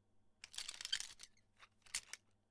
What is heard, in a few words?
Lock in Key Sound